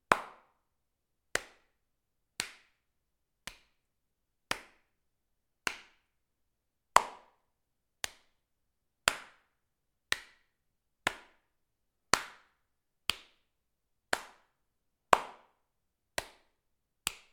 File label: Hand Clap High Five Realistic Slap Sound